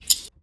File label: weapon; Ad Astra (reload 1)